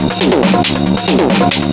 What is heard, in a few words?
drum, 138, drum-loops, Techno; Techno ID; fatboom